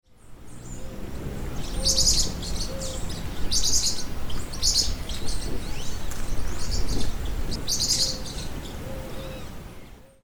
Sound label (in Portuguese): Forpus xanthopterygius Tuim